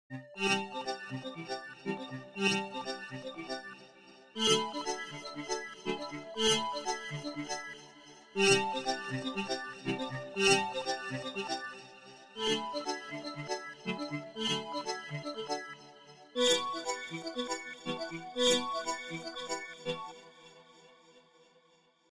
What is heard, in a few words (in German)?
Steuerung Arpeggiator